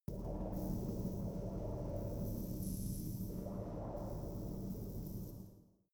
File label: space inside biomedical alien shuttle